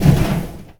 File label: Impact Small; Totaled; XBOX